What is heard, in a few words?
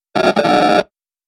robotic glitch